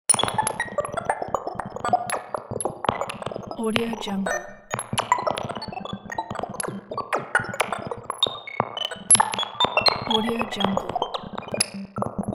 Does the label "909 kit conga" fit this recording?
No